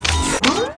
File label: WAR WALK